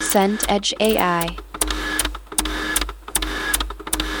car wipers

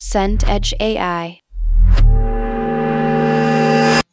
drone tension

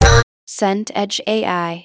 clap group

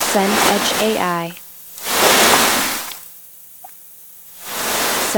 waves lapping jetty

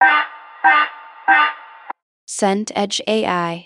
alert warning

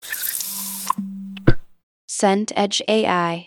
sip drink

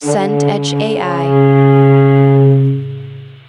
ship horn distant

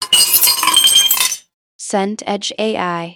shovel gravel

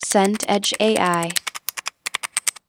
keyboard mech burst

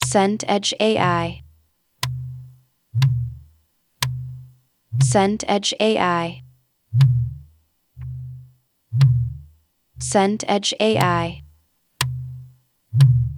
room tone car

